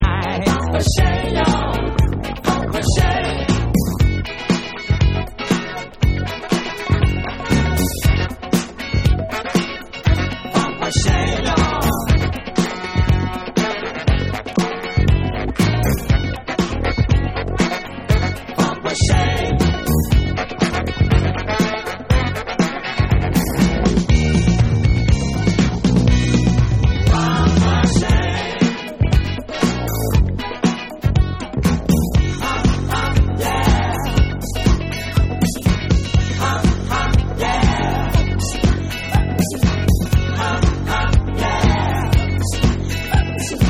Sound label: FUNK MACHINE Disco Version